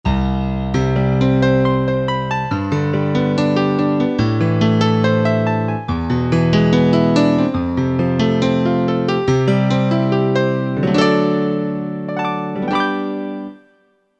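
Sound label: Piano Stereo, Korg MSC-9S Organ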